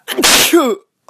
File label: sneeze short